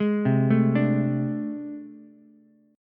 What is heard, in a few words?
A Minor Major 11th, down, Ukulele; arpeggiated; AmM11; JGuitar